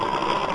hand turn

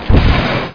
TRPEDO; XWINGTIE

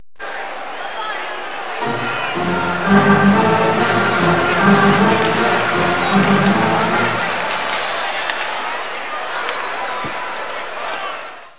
hockey; 8bit

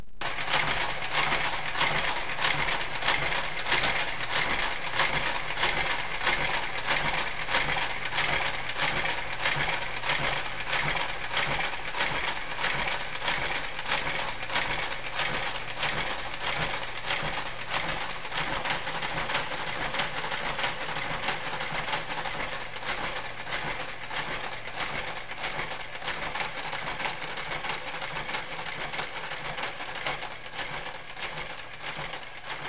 AKM

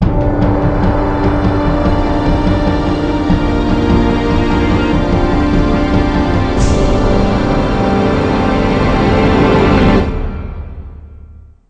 music man down; mcdemo

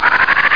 whisps; flip the frog; a day at the beach

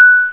dink

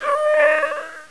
priest Struggle Fade; NETSTORM

